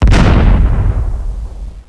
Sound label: Small Explosion; Close Combat